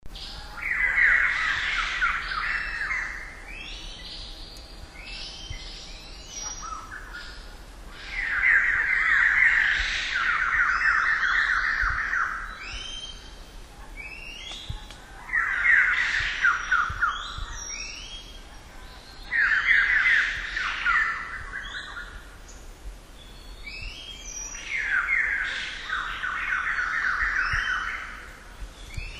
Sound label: White-crested Laughingthrush, Laos; Na Hin; George Wagner